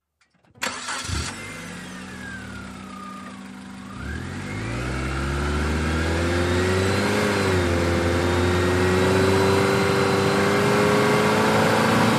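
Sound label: Small Car Engine Accelerate 55 mph, compact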